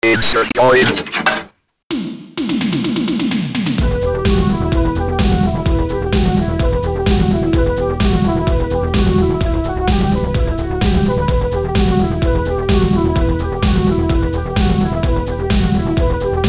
synthwave

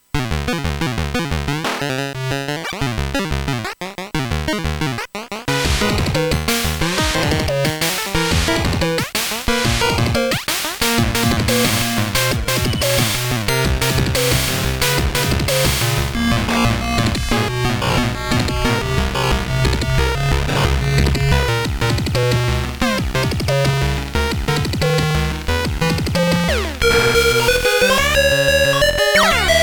hardstyle

